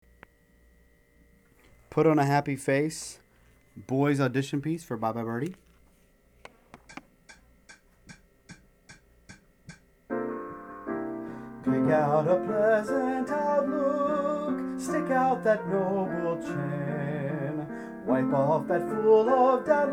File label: boys vocal